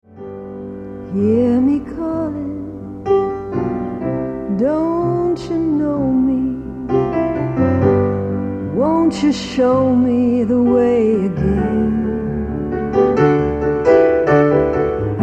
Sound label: country